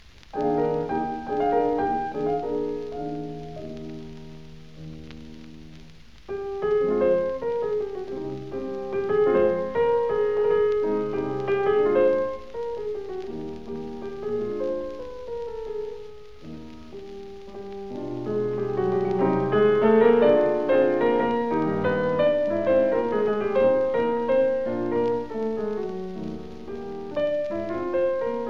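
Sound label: Chant polonais, piano; CHOPIN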